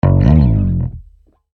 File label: instruments bass; maoudamashii